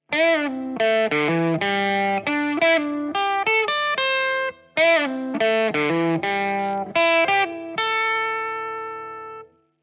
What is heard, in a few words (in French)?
test pedale solo